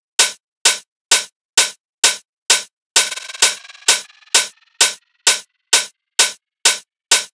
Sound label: Hat Loop 130, Techno